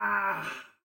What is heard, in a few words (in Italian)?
Soldato ferito; BLIND QUEST - The Enchanted Castle